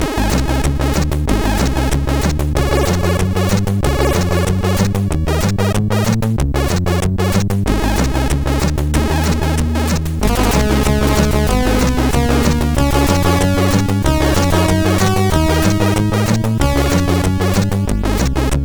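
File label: Rock n Roll, MOS 6581R4 SID chip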